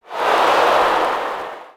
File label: Ooh, Crowd, reactions